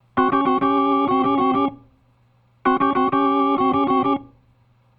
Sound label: Organ, 70s Analog Funk